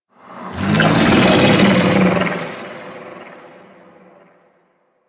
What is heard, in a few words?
Vocal Patrol, Enemies; Warframe; Teralyst; DE